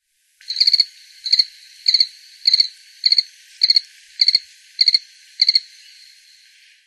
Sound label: Gephyromantis runewsweeki